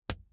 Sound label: ir front left ambix; Impulse Responses; Hyundai